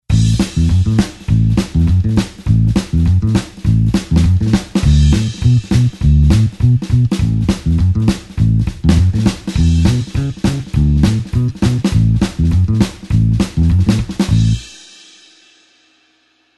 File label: blues bassline 2beat A tempo